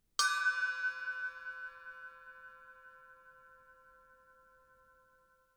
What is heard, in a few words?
Blast Bell Single Note, cymbal sound; FX Bells